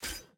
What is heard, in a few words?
back click; prestige